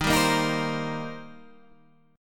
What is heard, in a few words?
Eb 6th Eb down Guitar Standard; Eb6 strummed; JGuitar